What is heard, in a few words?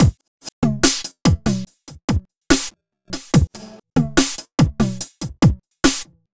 Crunch, Drums Beats